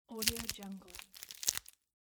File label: sticky tape removing from hand; mountainaudio